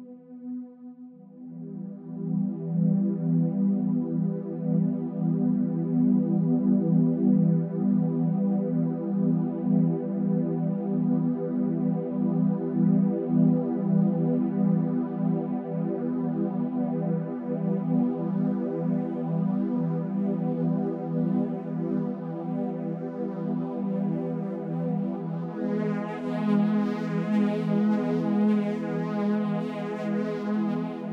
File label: Epic Sweller Pad